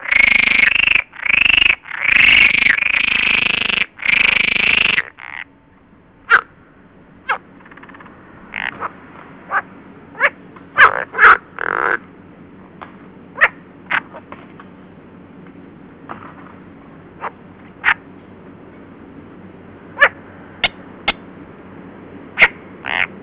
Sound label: saharica, waterfrogs